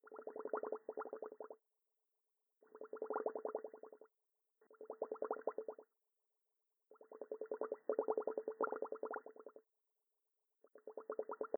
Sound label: water cooler bubbles